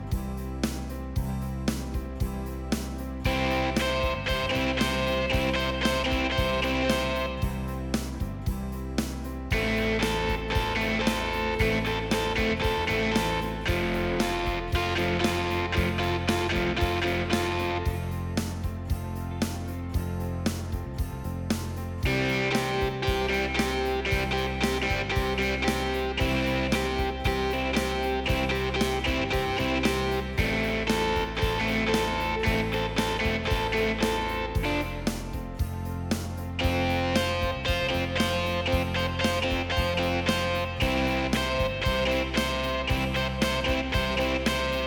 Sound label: GUITAR SOLO ENDING